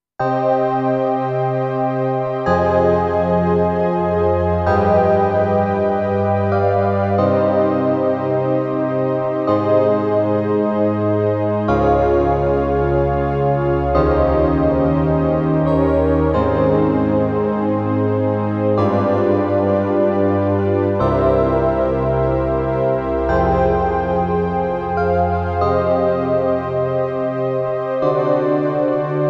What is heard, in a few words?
DX Analog EP, Yamaha Clavinova CLP-270; I SUONI - GLI STRUMENTI XG